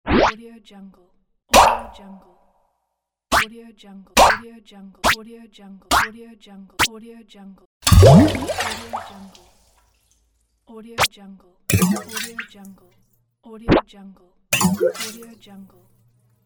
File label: plop blop splash pop